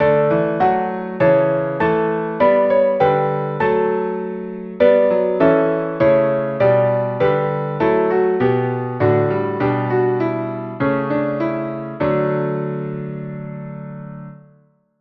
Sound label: Choral ala Bach - Piano